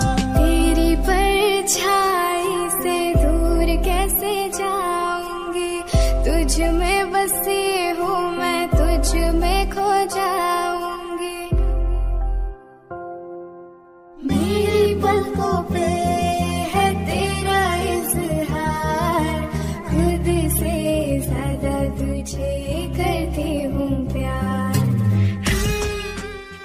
love mobile ringtone, bollywood ringtones

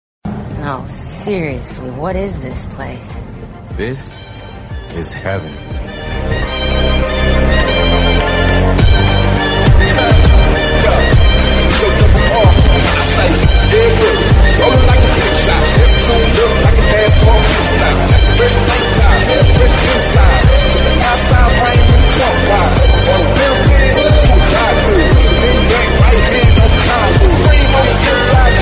cool english song tone ringtone; english ringtones

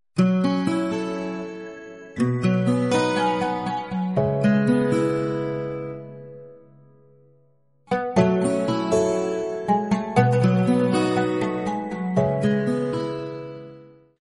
most romantic tone ringtone

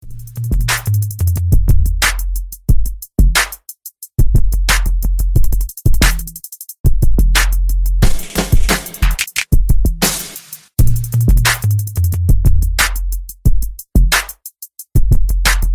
best hip hop ringtone; nokia ringtones

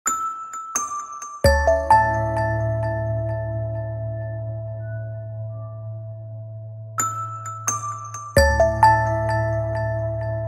Nokia distance music ringtone

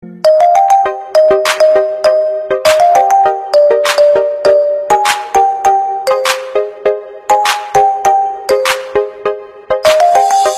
love you instrumental ringtone

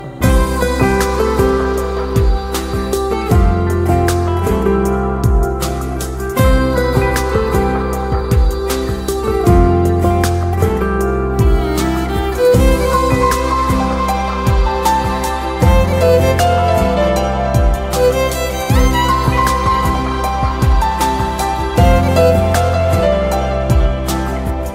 music ringtone

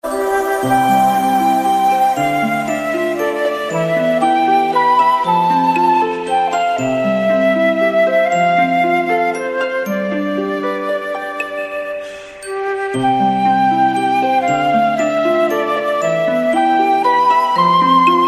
beautiful flute, ringtone

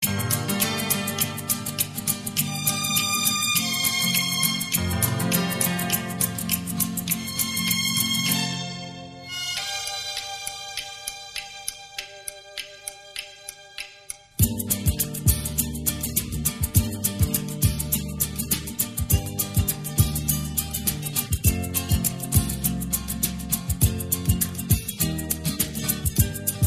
song instrumental ringtone; bollywood

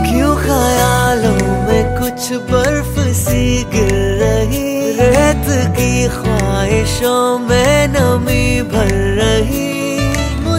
bollywood ringtone

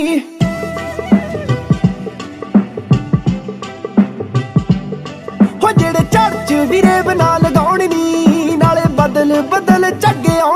punjabi ringtone; blackberry

